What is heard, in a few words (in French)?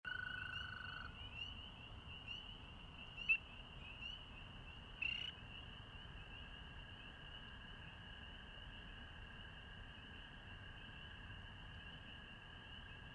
Crapaud d'Amerique Rainettes Cruciferes